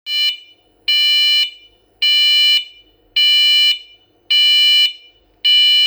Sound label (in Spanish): SLIM INTERMITENTE